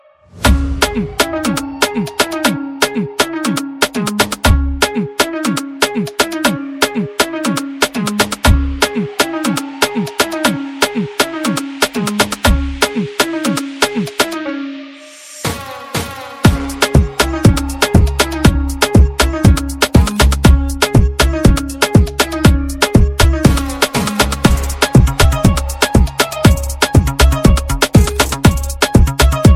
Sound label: Dembow Street Instr